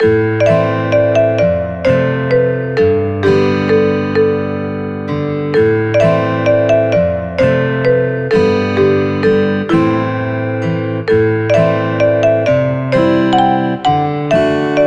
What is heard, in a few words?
130bpm loop